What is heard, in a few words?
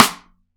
clap; osu